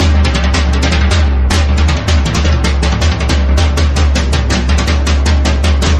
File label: drums; horde